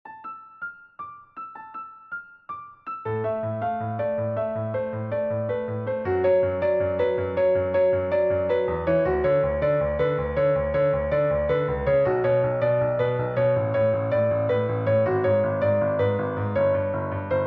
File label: solo piano; brani composti dagli alunni